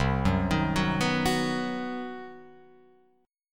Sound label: C Minor Major 7th Flat 5th chord, Guitar Open F; arpeggiated; JGuitar